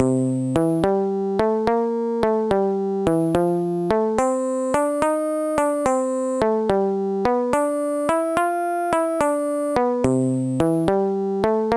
bass; Sonix Music Driver

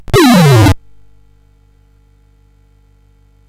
sid CSG8580R5; Frogger II-Three Deep

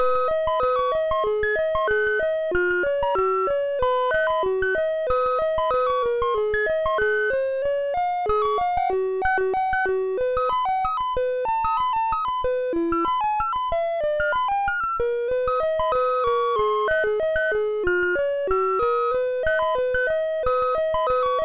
Buzz Off, MOS6581R3; GAMES

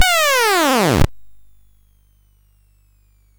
sid CSG8580R5; Magic Serpent

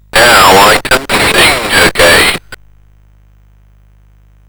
sid CSG8580R5; Out on a Limb

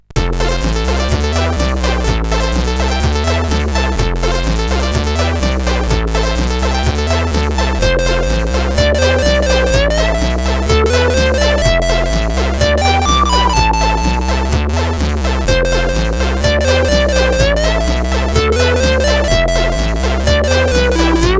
sid, CSG8580R5; A-Man

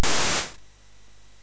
Ryshka, sid MOS6581R2

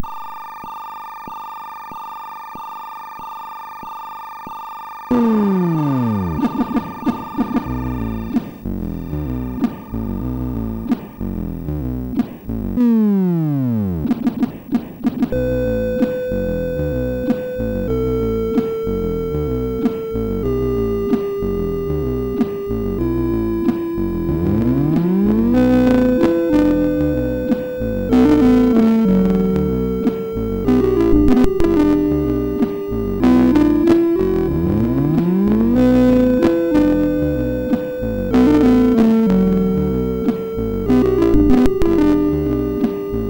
Second Shower tune,sid MOS6581R4

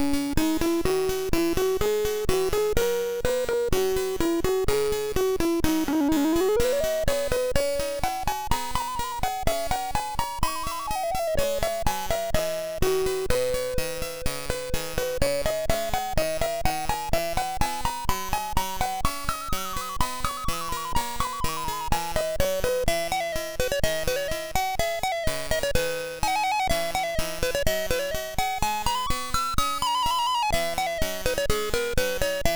D-Minor Sonett; Cubehead; MOS6581R2

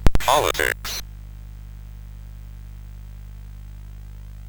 sid CSG8580R5; Cave of the Word Wizard Set 4

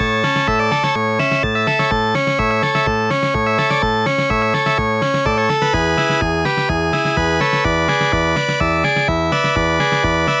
cajun casserole; Instant Music

